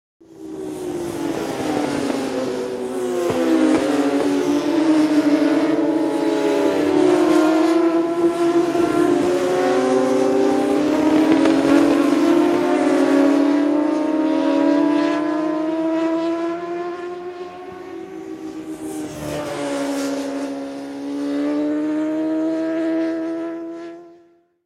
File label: Motorcycle Road Racing